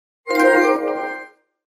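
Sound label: PS5 trophy sound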